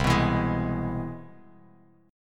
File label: C-Augmented Major 7th-C-down-Bass Standard; strummed; JGuitar